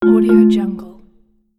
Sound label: message notification